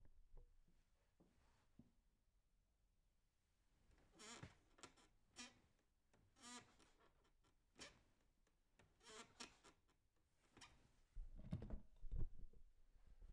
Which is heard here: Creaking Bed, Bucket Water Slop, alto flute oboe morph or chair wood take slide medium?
Creaking Bed